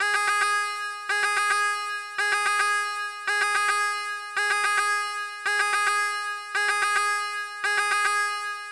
timer ringtone

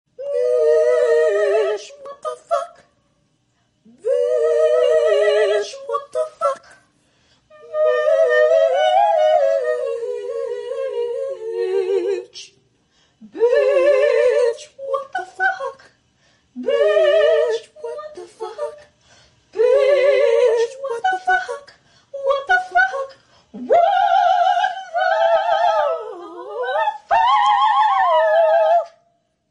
ringtone

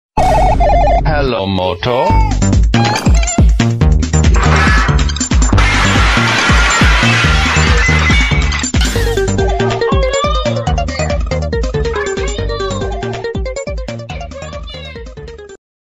hello moto remix

